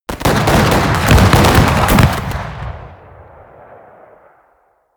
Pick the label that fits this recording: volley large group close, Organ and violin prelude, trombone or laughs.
volley large group close